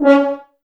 brass, Brass Ensemble, F.Hrns; Sample CDs; Miroslav Vitous; Woodwind Brass Ensemble; F.Hrns Links

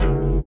LIQUITAR; Spectrasonics; Metamorphosis

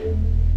E-1, BOURDON PED, Organ

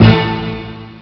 deep hit; ST-XX Amiga Soundtracker Sample Packs

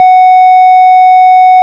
ORG Farfisa Clar, 16' F#4; VIP Cl; Farfisa Clar DBar

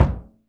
CEREMON, LRG DRUMS; Spectrasonics; Heart of Africa

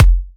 Electro Kicks

